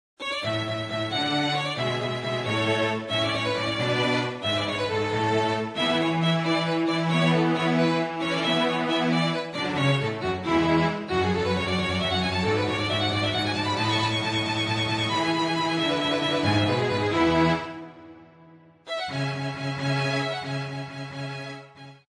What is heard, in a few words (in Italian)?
QUARTETTO IN LA MAGGIORE